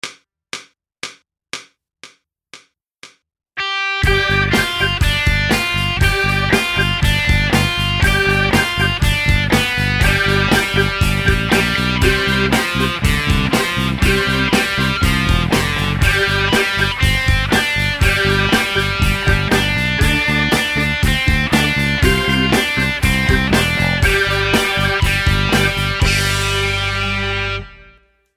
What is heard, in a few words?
12 bar rock